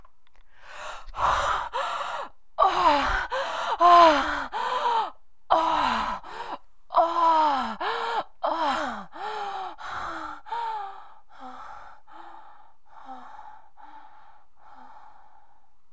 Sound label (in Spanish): orgasmo, ruidos